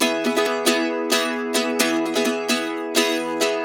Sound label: CEE RIFF, Blues Guitar